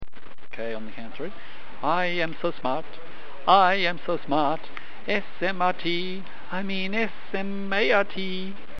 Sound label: Hum